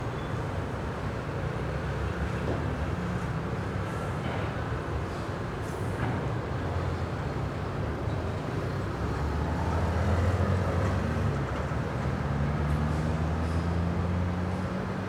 Muito transito longe alto do predio goiania noite alarme, carro de som

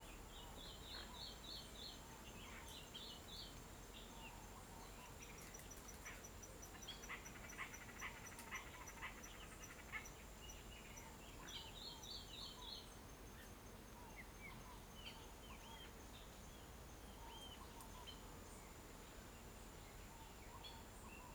Ambiencia floresta de dia com varios passarinhos, grilos e cachorro; ambientes naturais

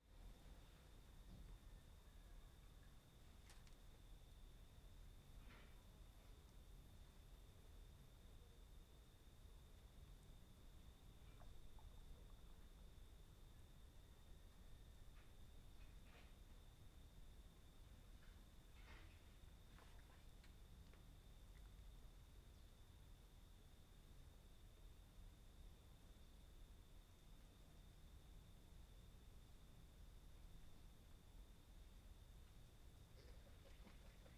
Ambiencia Ruinas do Predio em Ruinas da Universidade de Brasilia

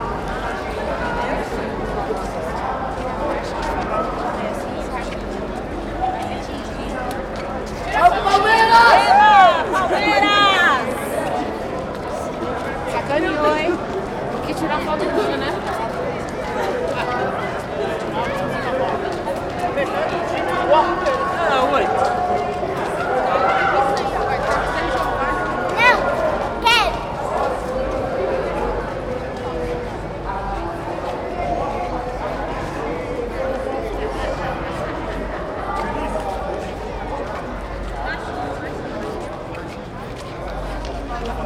Torcedores cantam; multidao; Torcedores ddo Palmeiras cantam; Colecao Sonora do Cerrado

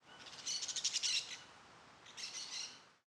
Piriquitos Voando e Cantando; aves; Coleção Sonora do Cerrado

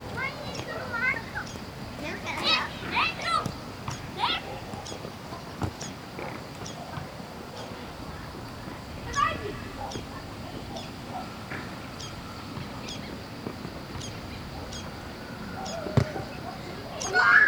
Criancas jogando bola ambiente rural, passaro, moto longe; Cerrado

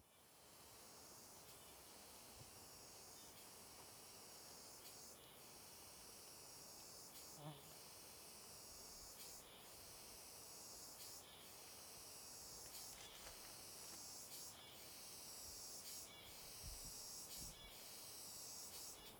Cigarra com Som Diferente na Beira do Rio; insetos

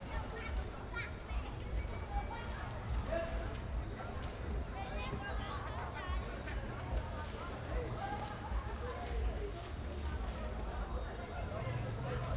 Ambiencia praca taguatinga a noite com pessoas e criancas conversando; musica

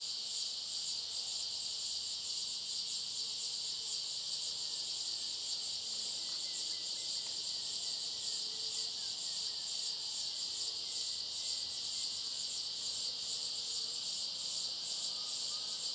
Cigarras mais fortes na clareira som agudo fino mosca, passaro, transito; insetos; bem longe

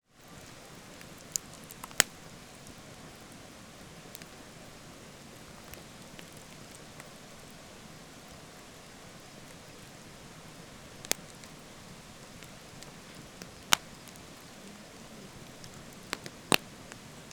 Fogueira perto de cachoeira com vozes noite

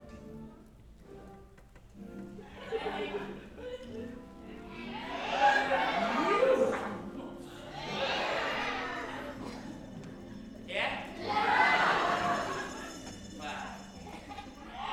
Apresentacao palhaco com musica, criancas, risadas, publico reage ao palhaco; multidao

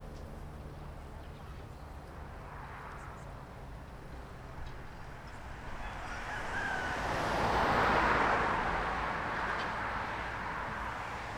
Estrada carros passando com pessoas longe, galo e passos; transito; Coleção Sonora do Cerrado

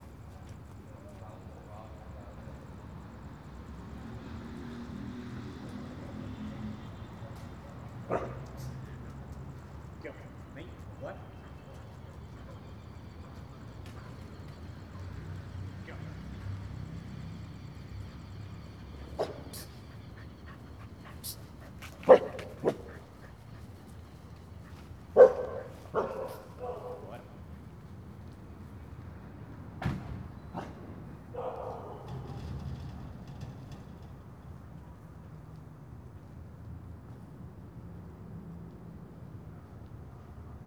Homem chamando cachorro que anda, respira e late a noite com transito; animais; longe; Coleção Sonora do Cerrado

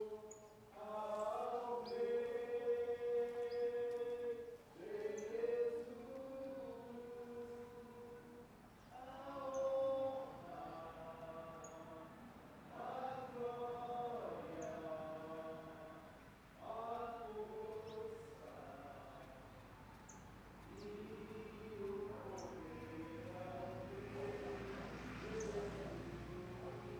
Pequeno grupo cantando em culto cristão

